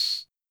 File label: Tambourine, Roland TR-77; Drums